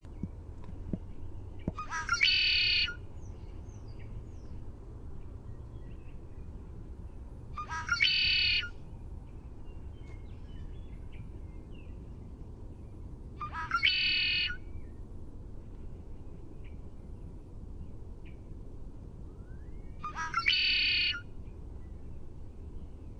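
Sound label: Red-winged Blackbird song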